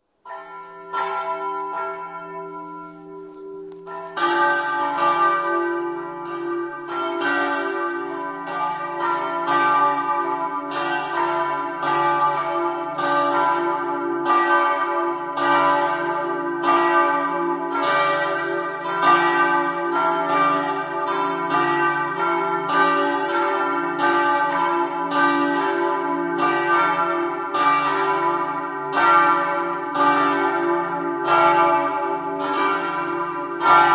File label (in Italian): campane; muggia